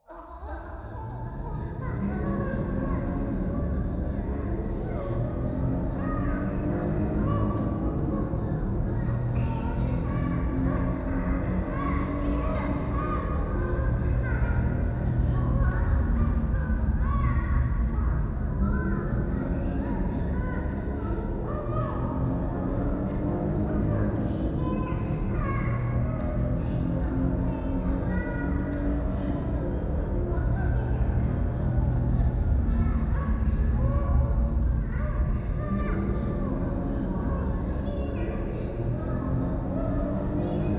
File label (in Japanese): 低音喘ぎ 車の中